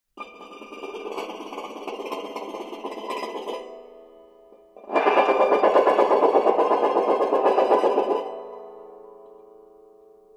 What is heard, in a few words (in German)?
gewindestange hoch, banjo